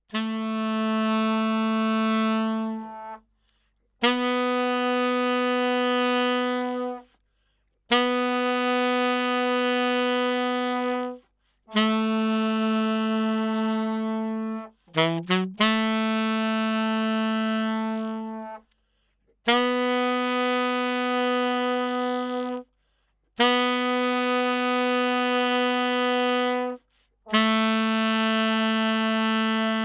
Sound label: sax